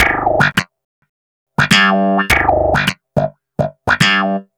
Wah Snap in G, Snap Bass; Basses

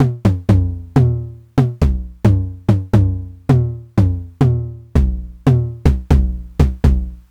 909 Tom Low

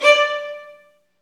STR VN, Roland, STR Vls Marc; Roland L-CD702; Vlns Marc1-3

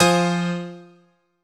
stab keyed F; stabs

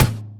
Percussions

